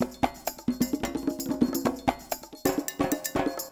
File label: Loop Percussion 130; drums pads